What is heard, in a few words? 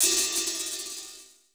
POP OPHH; Crypton - DrumBase5000; R70 KIT #1